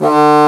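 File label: Contrabassoon mf D1; Digital Sound Factory Virtuoso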